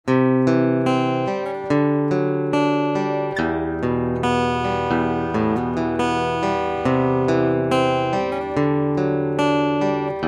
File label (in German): Picking nur Pick Up